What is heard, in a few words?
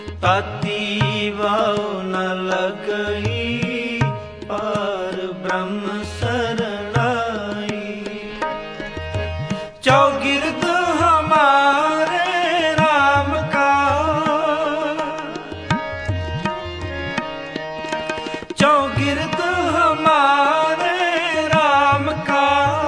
Sikh Ringtone